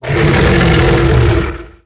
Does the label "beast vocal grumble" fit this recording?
Yes